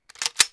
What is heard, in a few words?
reload; Mafie 1